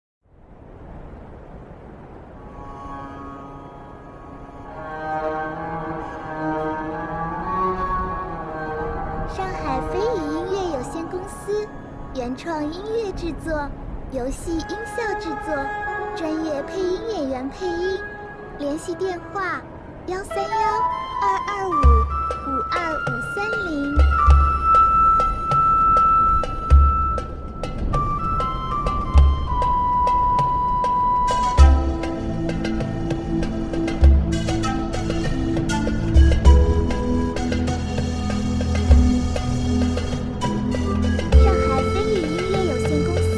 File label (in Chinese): 舒缓神秘; 传说之城